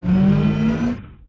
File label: grave upgrade; Sid Meier's Civilization 4 Complete; Beyond the Sword; Units